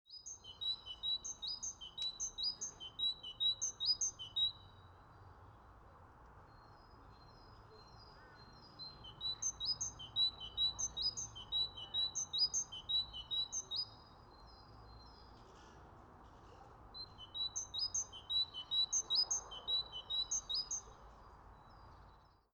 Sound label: Parus major; SETE